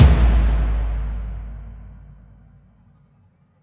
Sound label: One Shot Ending